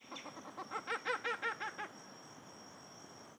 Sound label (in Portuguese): Canto de Acaua Rindo; aves